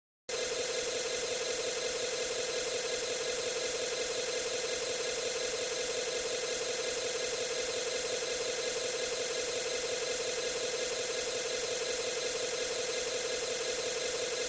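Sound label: Computer Read Out Fast Cycle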